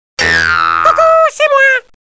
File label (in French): bruit; MOBICLIC37